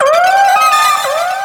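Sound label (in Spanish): "Grito de Lumineon", Pokémon; Actualizando grito en la sexta generación (XY)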